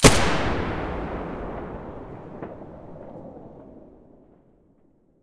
muzzle g36 stereo, weapons

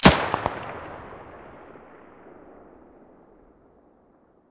muzzle stereo

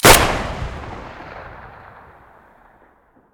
saiga muzzle stereo, weapons

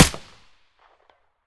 aps silencer stereo; STALKER; IWP

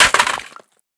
riffle drop tile; STALKER; IWP

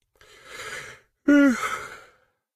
sleep; STALKER; IWP

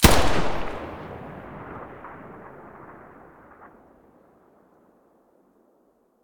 muzzle fn2000; S.T.A.L.K.E.R.; IWP

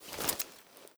knife holster, weapons

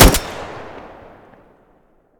groza muzzle mono, weapons

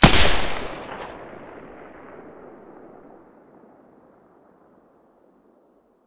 silencer g36 mono, weapons

